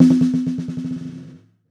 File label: Birch Long Drag, snare; Drums; Ghosthack - Free Snares 2023